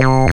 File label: JAM SYNTH, KEYS